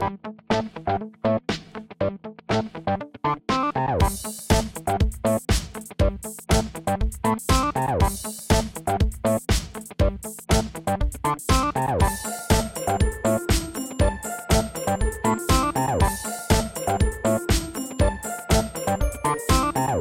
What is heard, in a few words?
Techno